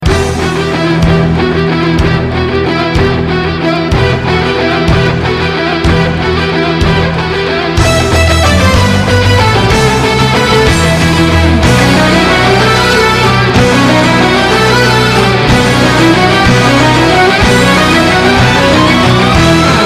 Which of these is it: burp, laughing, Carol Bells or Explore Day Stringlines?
Carol Bells